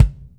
Kick, Akai MPC-500; Toolkits; Kick TKit

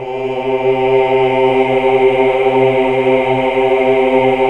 AAH UNI

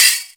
metal; Ragga & Dub Reggae; USB Soundscan vol.27; AKAI

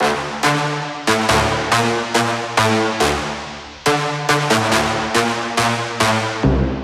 Brass Loop 140BPM Dmin; Brasses; Instrument Loops; 99Sounds; Monosounds

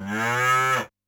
COW; Yamaha DD-65

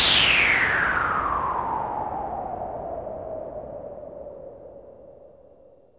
WHITE NOISE; SYNTH FX; AMG; Global Trance Mission VOL-2

